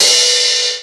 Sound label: Misc Hat, Drum Machines